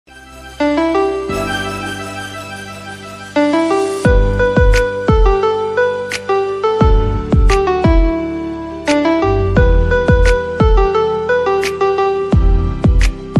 Popular Instrumental Ringtone Romantic Ringtone Hindi Ringtone Punjabi Ringtone